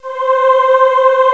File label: choir; Descent II